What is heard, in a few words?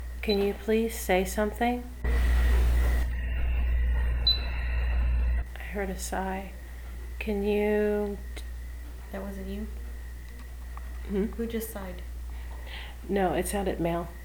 living room sigh